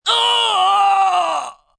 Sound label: Pain Short Cry, Pain, male, short cry of pain, being stabbed, agony, moan (human voice); VoiceBox